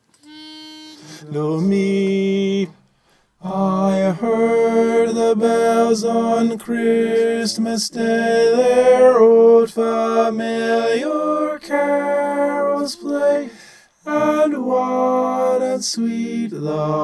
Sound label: i heard the bells tenor